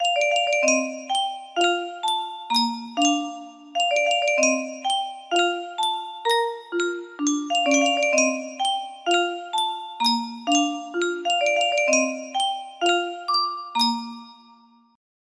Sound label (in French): Yunsheng boite a musique, music box melody